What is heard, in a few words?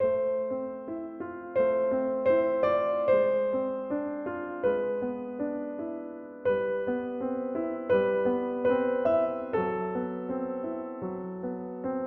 Bandoneon